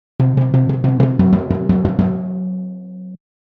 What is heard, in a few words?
Timbales Break